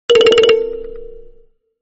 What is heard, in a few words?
Kalimba, ringtone; Nokia